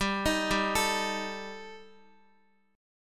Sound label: G Suspended 2nd Sharp 5th, Guitar; Gsus2#5 arpeggiated